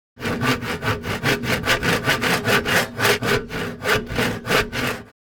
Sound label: Sawing wood fast handsaw